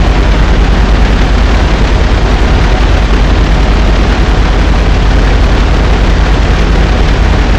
Vehicle Engines; Red Orchestra Ostfront 41-45

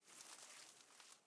leaf rustle twiggy soft

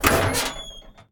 Amb Constructions; Red Orchestra 41-45

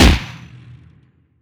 Automatic GShG end, Weapons; DCS

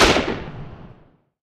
ho103 lastshot right, guns

